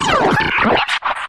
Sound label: Harsh Sputter